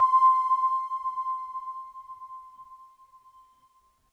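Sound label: sine C5, Yamaha VP-1; Music Store; 90's Sample CDs; NICE SINUS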